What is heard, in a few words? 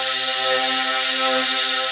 SYNTHETIC, STRING; Q Up Arts; Dream Experience